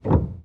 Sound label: piano release